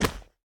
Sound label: footstep metal; Smite; Vulcan